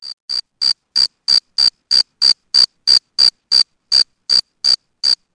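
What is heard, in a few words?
cricket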